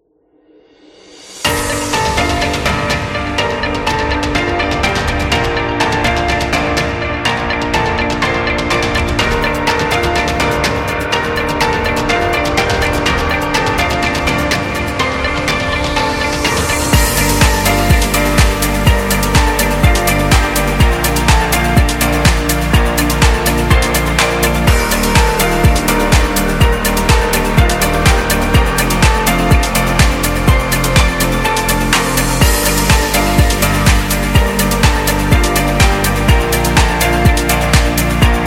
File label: Dynamic Corporate, corporate music; corporate music vol 22